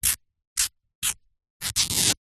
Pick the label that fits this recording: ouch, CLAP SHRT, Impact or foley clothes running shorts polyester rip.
foley clothes running shorts polyester rip